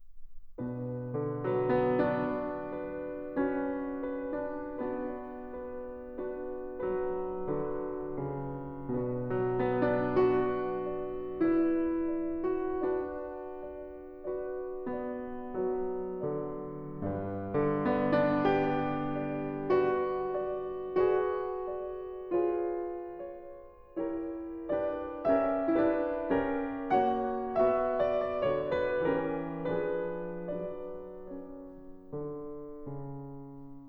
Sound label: Prelude op.28 no.6 in B minor; Chopin; Preludes